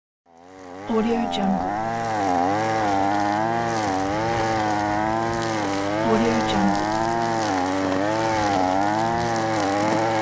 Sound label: lawnmower